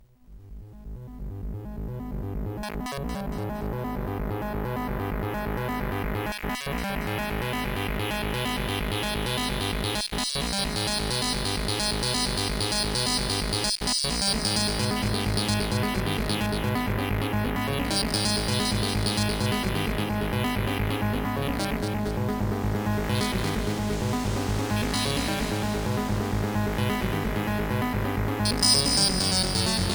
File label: ocean view; Symphonie Pro